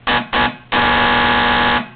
Buzzer; HyperInstaller

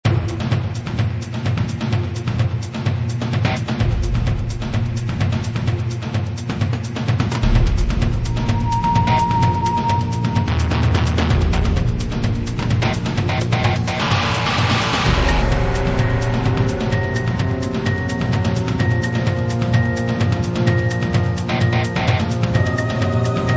music game loop; Jungle Reporter

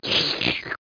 slurp

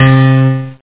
Piano note

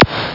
zap; ActionCat

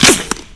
wpn impwood, weapons, impact; Spearhead Demo

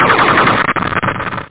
explode

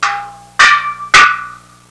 asian metal drum, percussion instruments; Sound Effects for Multimedia - Volume 1

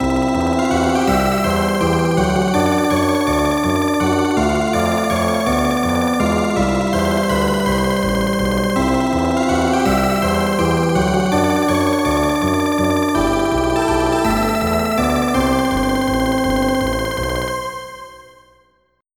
RIDE; ThemePark Demo

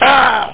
player die; galleons

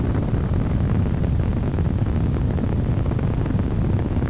Blast

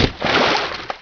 h2o jump; quake; player

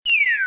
DESCEND

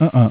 UH UH

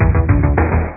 Riff; ExChaos

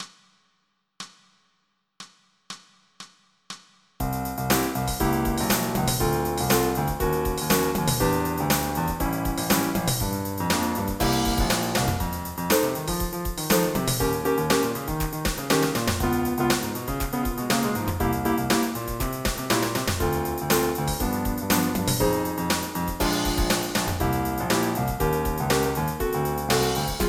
blues; 3800 MIDI Magic

